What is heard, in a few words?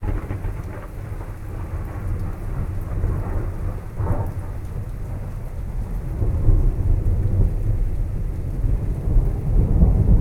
outdoor